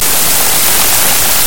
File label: Hit Punjabi